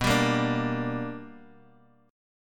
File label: B-Minor Major 7th Sharp 5th B down; BmM7#5 strummed; JGuitar